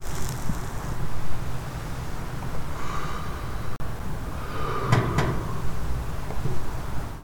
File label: Cigarette